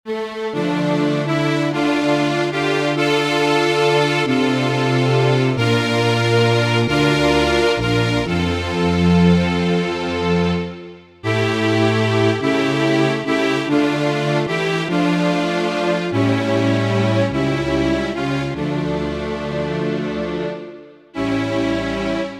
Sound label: cin str; CMS Weihnachtskalender 2023